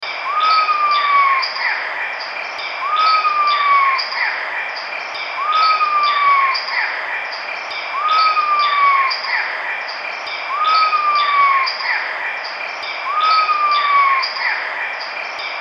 Colombian Screech Owl; Nick Athanas

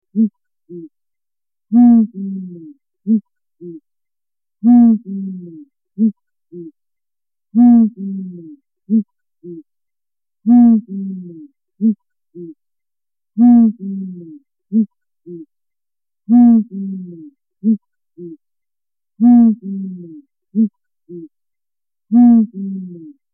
Blakistons Fish Owl Duet; Jon Slaght

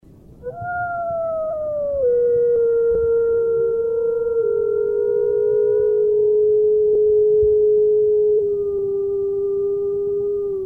Mexican Wolf; David Parsons

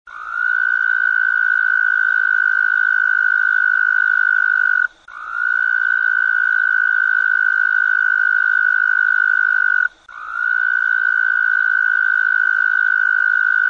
Arroyo Toad; Carlos Davidson

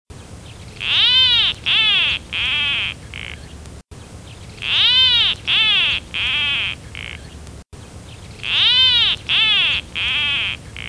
Peruvia Plantcutter; J Flanagan

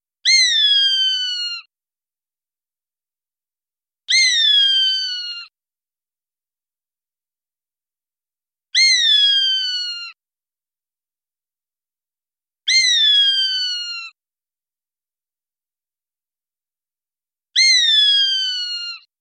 Gray Hawk; ASU; Ask A Biologist

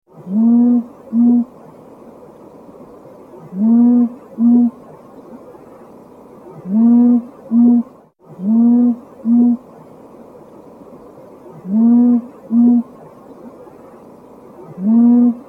Rufous Owl; Owlpages

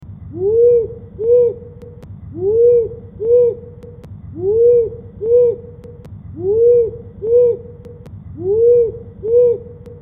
Powerful Owl Female; Richard Jackson

